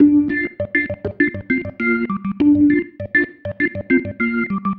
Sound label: Pattern, Organ